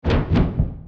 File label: wing flap; dogma